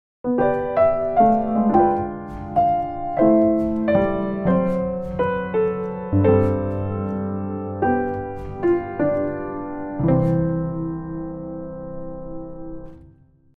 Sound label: G E7 Am Fdim, chord progression; chopin nocturne op 9 2